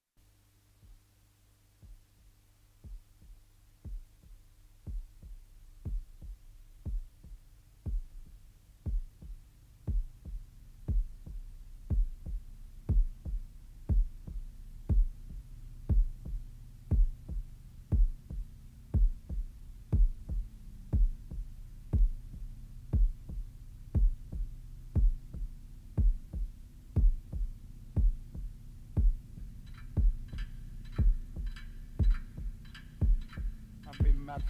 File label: effects, scream removed, from 5.1